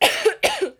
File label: Teenage Girl Cough